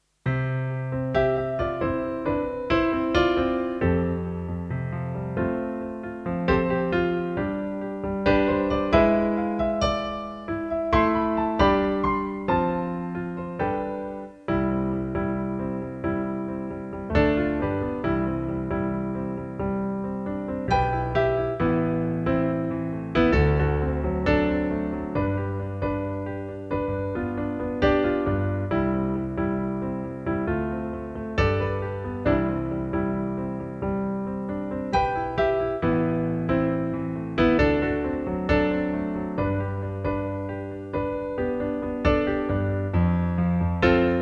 piano

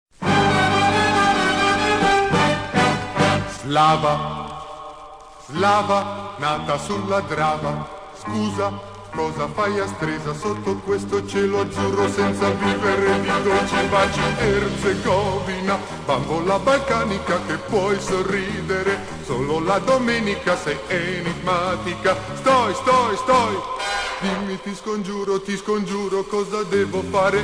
orchestra

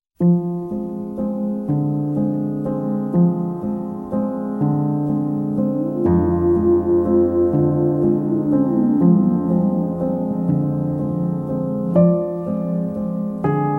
Liquid Spear Waltz

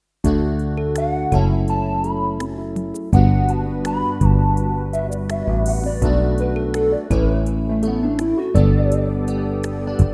SOFT BEAT

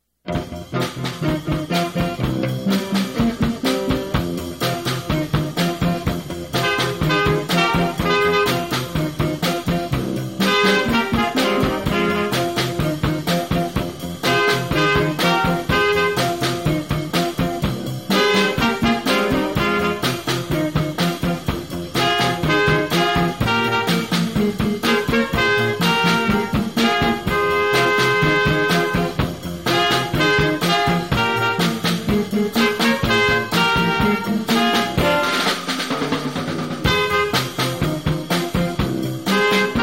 exotic inst

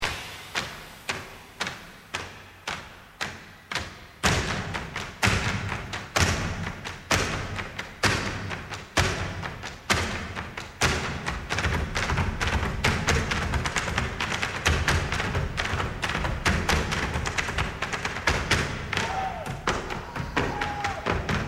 Thunderstorm; Riverdance

